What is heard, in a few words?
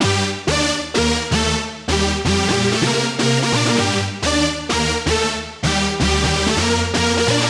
future bass house loop